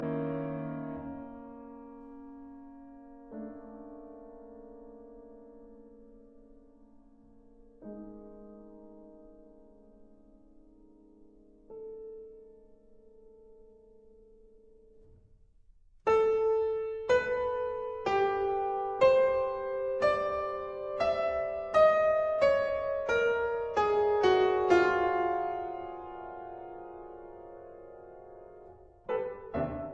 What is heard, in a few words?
Piano Sonata No 2